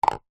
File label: household cup plastic empty set down table; zapsplat